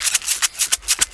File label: Percussion Loops, Shaker & Tamb; Houseworx